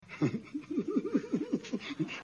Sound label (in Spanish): risa; don ramon